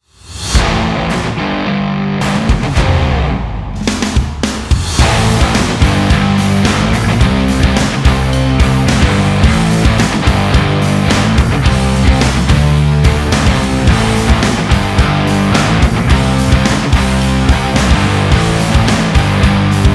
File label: hard rock